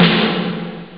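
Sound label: snare drum; ST-XX Amiga Soundtracker Sample Packs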